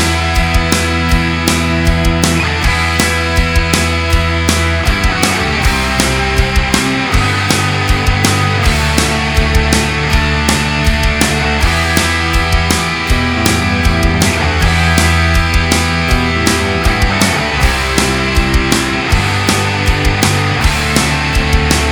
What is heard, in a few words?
Heavy Metal